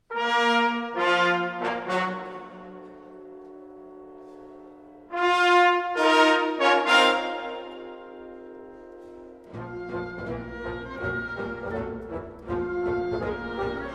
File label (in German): Marsch; sinfonischen Metamorphosen